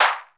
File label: HAND CLAP; Music Store Sound Service; Einstein